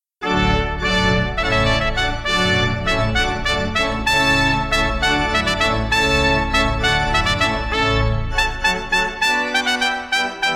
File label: Water Music Allegro; wedding